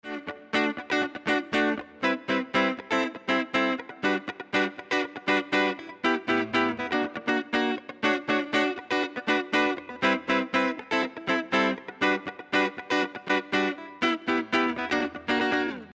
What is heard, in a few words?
Guitar Rhythm Dry; SRE330